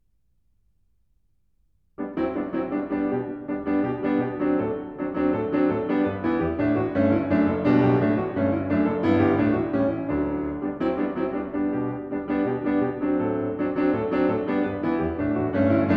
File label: Small Piano Pieces, Allegro molto; Grieg; The Complete Music For Piano